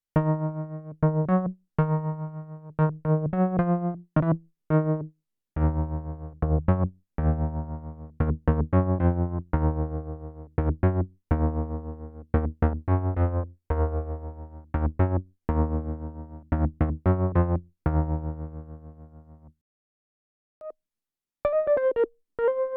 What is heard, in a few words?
Wurli